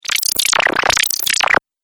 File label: Alien Wet Element; Alien Sound Effects